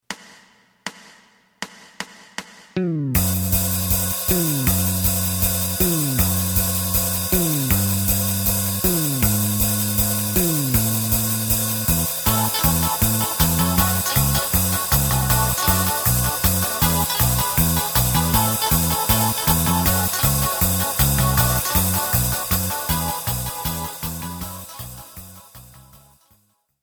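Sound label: lead guitar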